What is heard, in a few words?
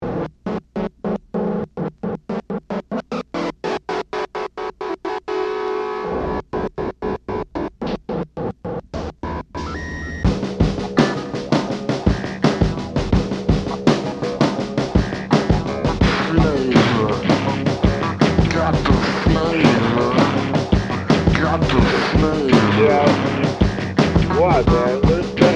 blues E